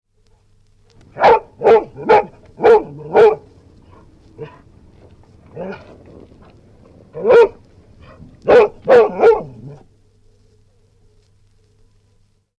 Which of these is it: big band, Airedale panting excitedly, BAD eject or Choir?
Airedale panting excitedly